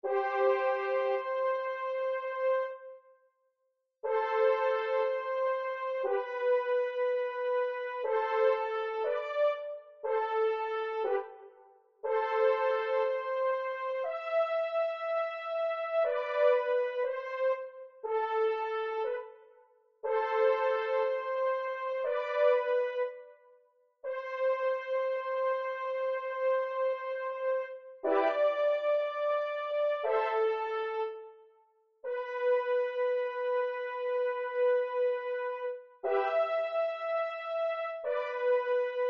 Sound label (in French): cor d harmonie; MuseScore